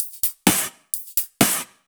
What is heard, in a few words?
Electro Loop 128BPM; Vengeance Samples